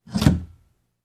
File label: Cabinet Door close